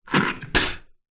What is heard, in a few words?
human spit toothpaste into sink